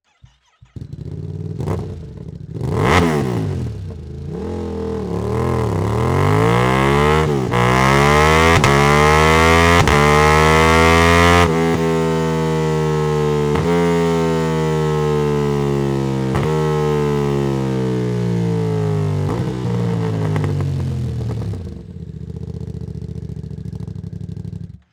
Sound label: akrapovic evolution exhaust system ducati panigale v2